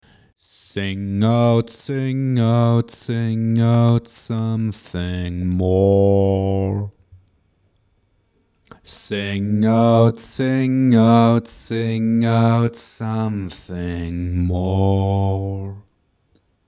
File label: Double Track